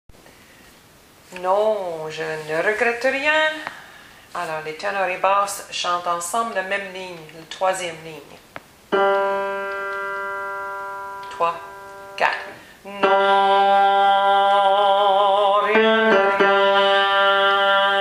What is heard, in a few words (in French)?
basses, chorale